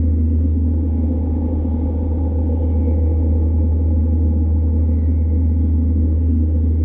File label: Psycho Drone; Dance; SFX